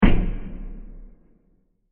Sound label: Deep Stab; Bursts; Impacts